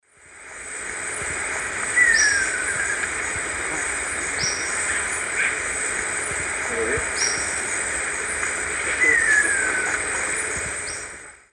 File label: Monasa nigrifrons, call; S. America; Eastern Lowlands